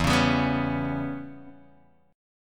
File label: E Minor 6th guitar chord, Open D